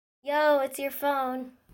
text tone

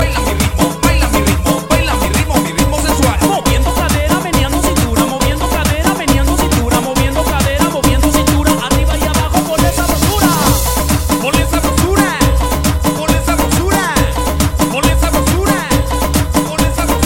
Cumbia Mixx

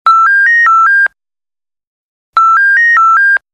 Old Electro Phone

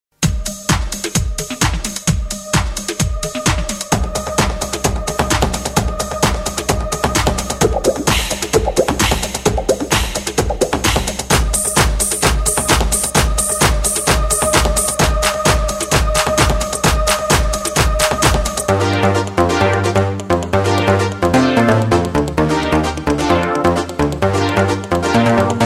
Rock

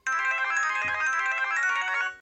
Motorola V3

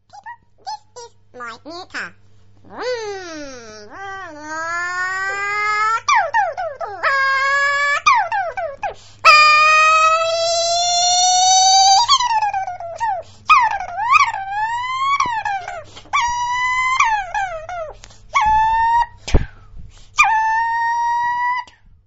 Funny Sick Car

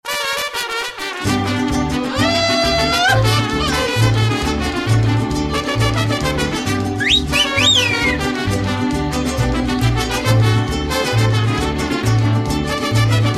Mexican Mix

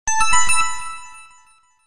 Sms Alert

